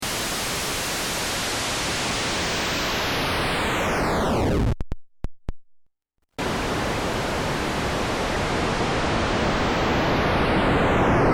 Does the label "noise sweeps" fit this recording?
Yes